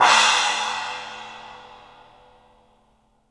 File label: Studio Cymbals; E-MU Producer Series Vol. 5 – 3-D Audio Collection; 3D Percussives